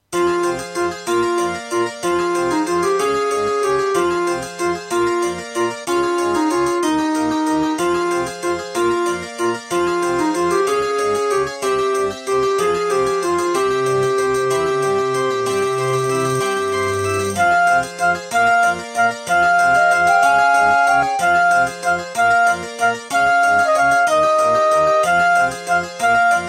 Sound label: Diamond Monster Sound 0.5MB; Death Gate